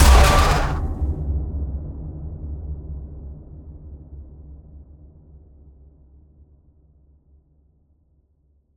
distorted hit intense trailer elements; Sonniss GDC

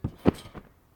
trampoline realistic

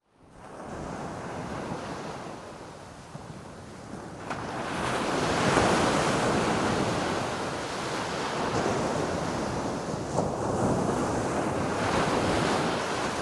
beach ocean waves; fienup; sonniss gdc

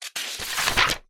notepad page turn; sonniss gdc

